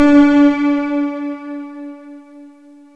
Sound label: FM PAD; FMSTORY#2